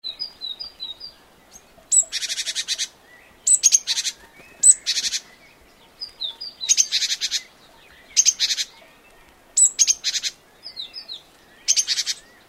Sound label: great tit